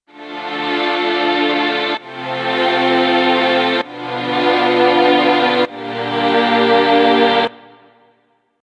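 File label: Mellotrons, Turntable Tron; PC3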